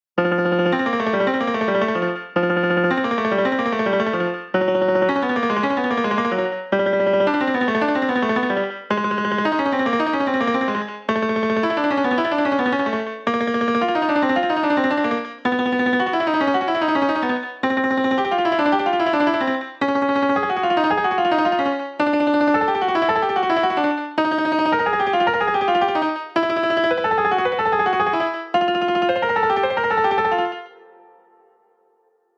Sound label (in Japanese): タングトリル用-ピアノ-男性高音